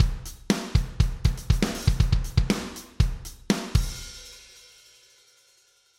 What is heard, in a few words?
drums SSL